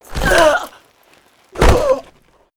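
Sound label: death, monster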